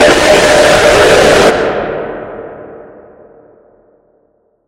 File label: jumpscare sound; robotic life 2 0